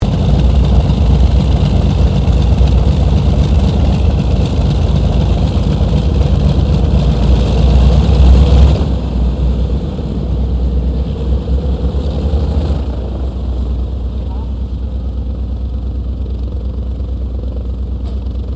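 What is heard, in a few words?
AIRCRAFT LOCKHEED L-1049 SUPER CONSTELLATION SHUTDOWN RIGHT; 1955; Connie, Super Constellation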